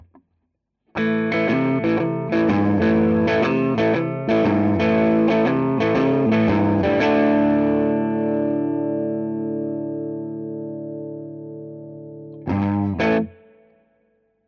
ampeg gvt15-112 combo G12 V17 HALF, Gitarrencombo in Vollröhre

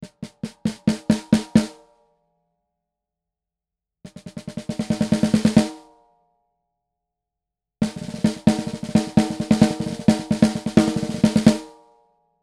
beyerdynamic tg d35d snare klemme mix

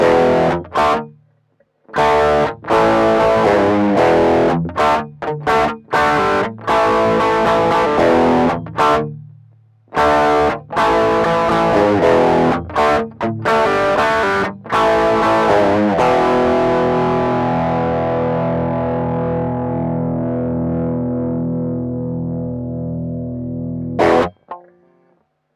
xotic bb-preamp gain-max aktive pickups

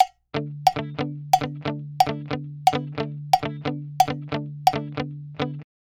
gitarre comping shuffle falsch gesetzt

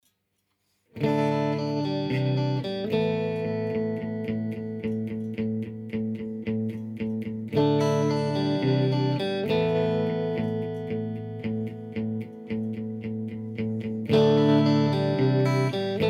gitarre; eiosis e2deesser

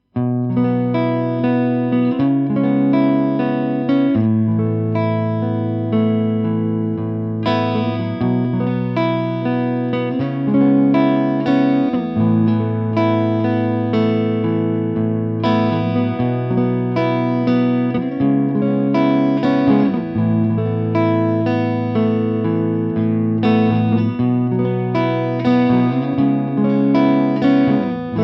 klang test clean, Shure SM57; sdm-57

